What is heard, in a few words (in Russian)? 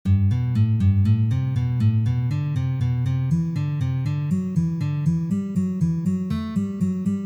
Uprazhnenie dlya igry gammy Sol mazhor